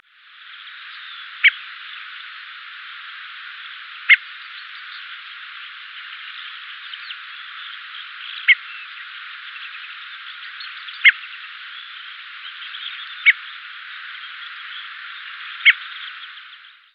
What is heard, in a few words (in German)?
Rotdrossel